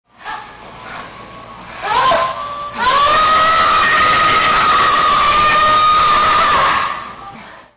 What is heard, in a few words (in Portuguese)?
mulher gritando